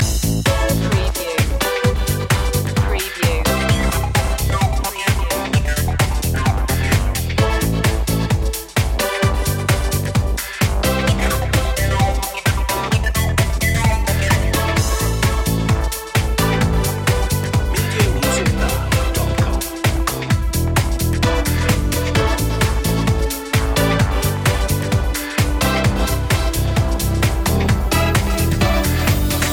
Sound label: up all night loop